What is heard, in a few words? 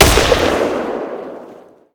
Sniper; Risk of Rain